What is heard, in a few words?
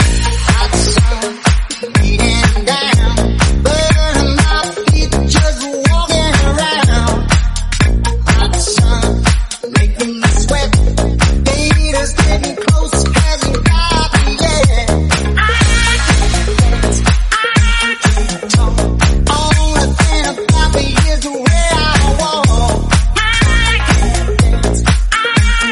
Funky House 123bpm Bbm